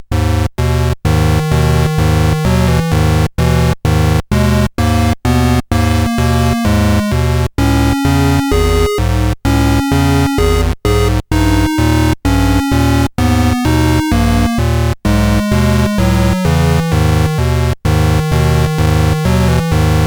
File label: sid CSG8580R5; Treasure Island